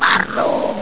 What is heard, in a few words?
pain; alienarena; grey